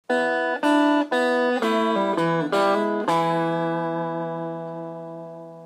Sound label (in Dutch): Gitaar Lick Hybrid Picking techniek met Pedal Note